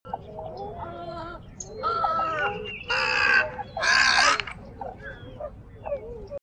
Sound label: great blue heron, birdsongs, belize